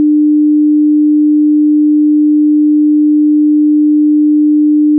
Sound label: Humm